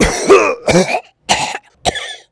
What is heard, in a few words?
cough; z-lapik ru